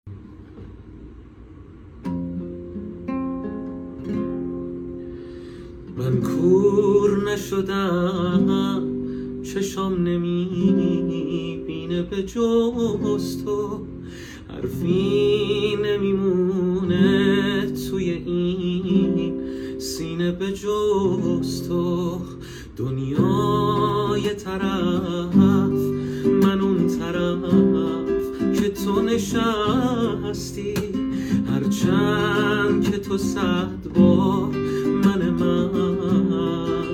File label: man koor nashodam guitar